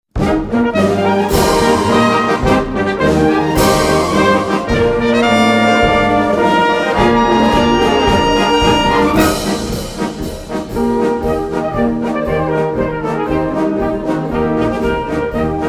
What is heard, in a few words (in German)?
Pressbaumer Marsch